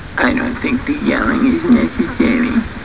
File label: yelling